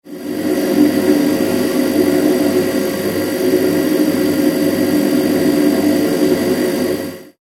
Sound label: household fridge freezer hum; Kitchen; Fridge Operating Hum; McKinney Sound